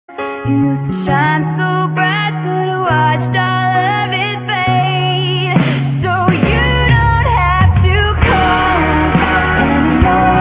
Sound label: disco